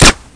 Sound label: stoner; brainbread